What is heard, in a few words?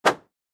Motion Swish